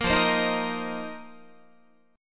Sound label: F 7th Suspended 4th F down Guitar; strummed